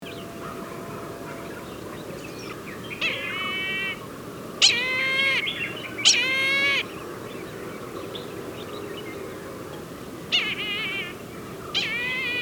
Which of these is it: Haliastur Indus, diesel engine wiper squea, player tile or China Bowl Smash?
Haliastur Indus